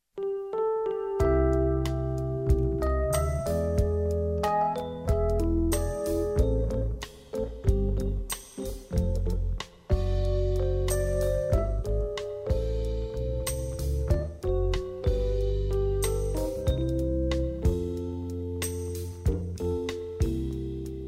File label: Quartet